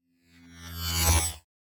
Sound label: Button Loading Beep; Hollywood Edge; sound designer's toolkit v2